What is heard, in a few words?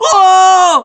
Damage Fly; SSBBrawl; Fox